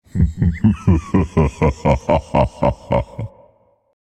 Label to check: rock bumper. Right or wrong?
Wrong